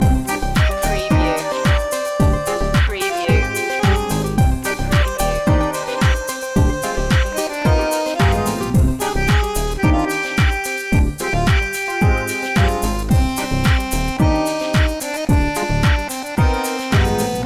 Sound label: tango chill loop